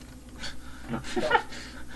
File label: laff